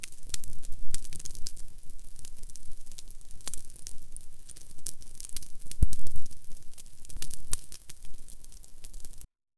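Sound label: tiny dust particles jumping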